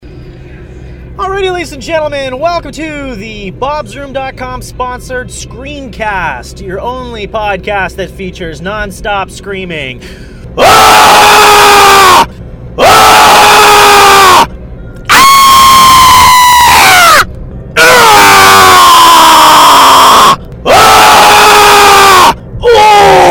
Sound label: screamcast